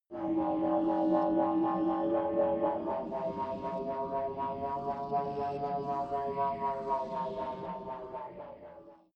generator strained; comedy-cartoon; elektronisch

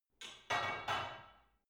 barbell only replace reverberant; sport freizeit; fitnessstudio

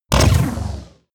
sci-fi click negative heavy; hits impacts

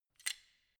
wrench close soft quick; schrauben drehen hydraulik

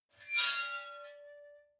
metal squeal bright fast; metall

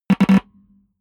button, signale; soundpool

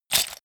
cup of pens; bueroarbeit

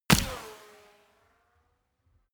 bullet small impact ricochet; krieg-waffen; geschoss

